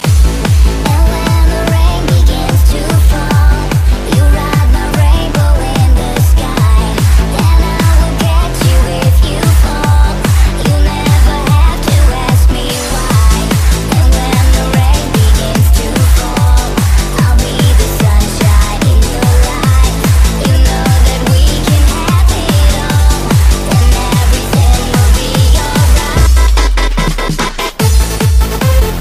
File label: Horns